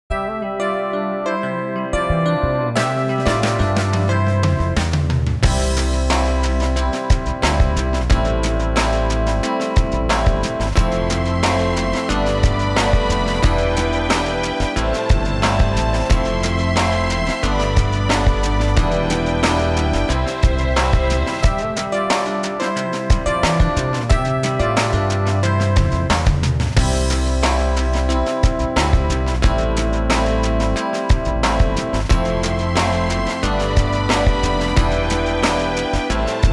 Ich habe keine Zeit instrumental

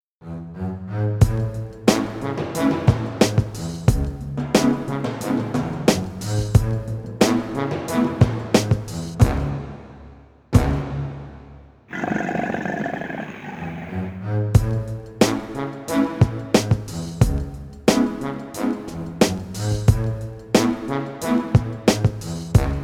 Das Krokodil instrumental

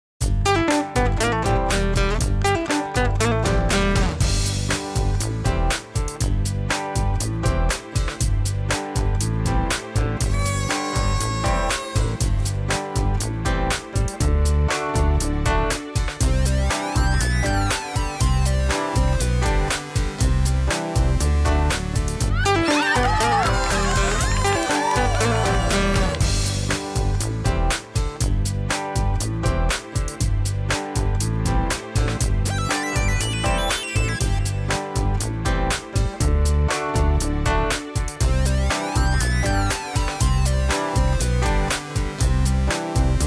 Geburtstagslied instrumental

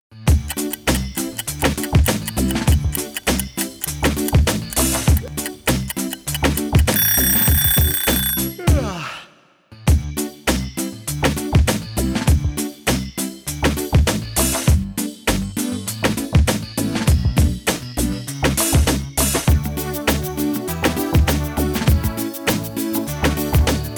instrumental

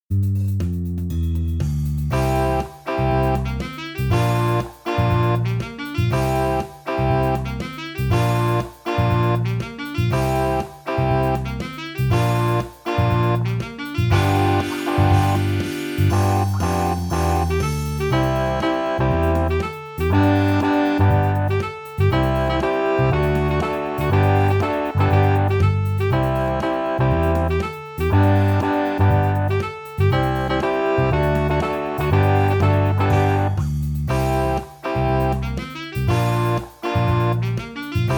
In meinem Haus instrumental

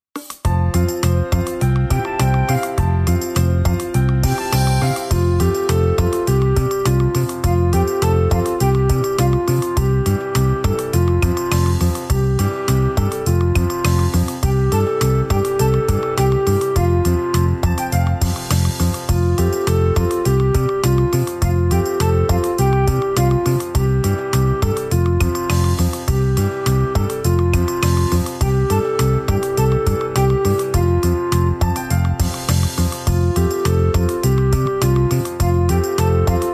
Wo ist mein Bleistift instrumental

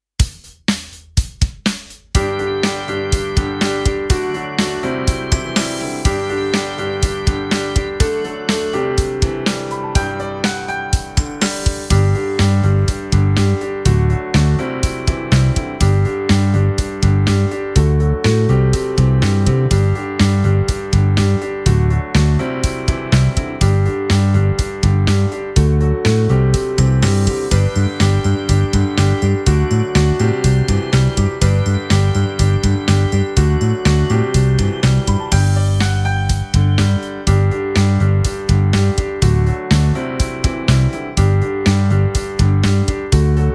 Meine Kleider instrumental

